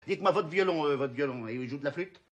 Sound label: violon flute; louis de funes